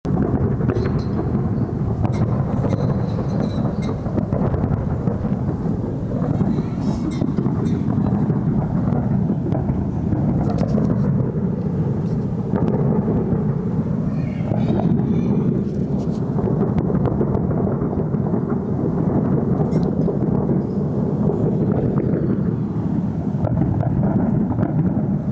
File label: fireworks